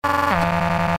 Buzzer

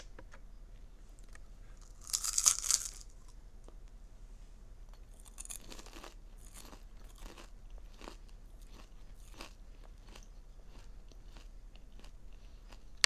Popcorn Bite

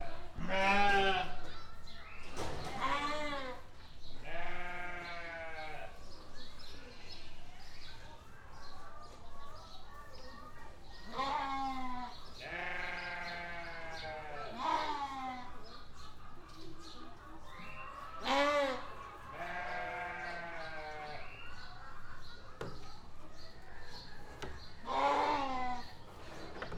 Sheep Pen

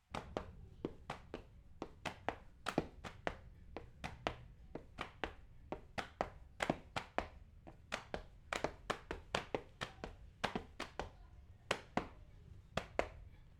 Gumboot Dance

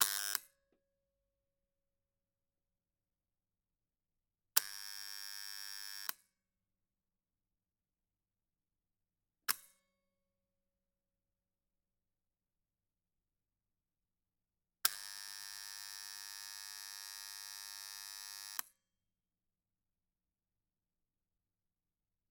Buzzing Relay

